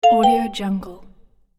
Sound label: marimba app advance